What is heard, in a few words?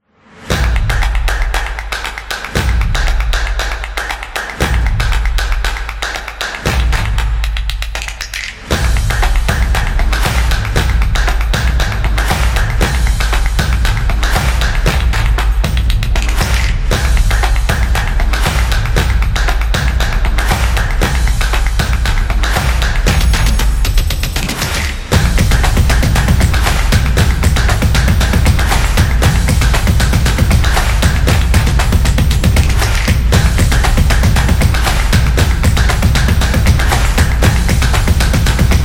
Cheerleading, football music